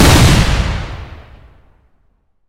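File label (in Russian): оглушительный взрыв от выстрела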